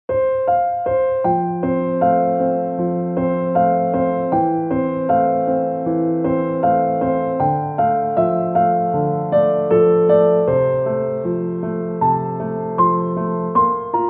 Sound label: piano